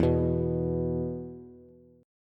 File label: E 5th E down Bass; strummed; JGuitar